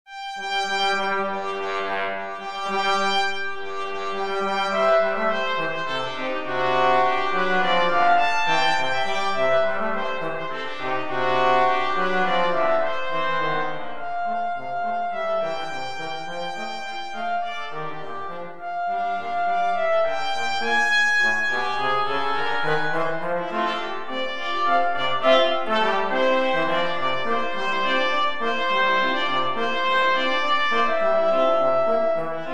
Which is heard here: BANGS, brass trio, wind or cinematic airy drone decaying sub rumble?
brass trio